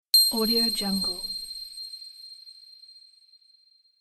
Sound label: bells sound